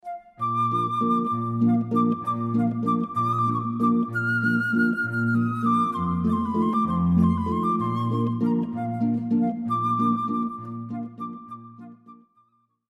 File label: Figaro theme, Mozart, harp flute cello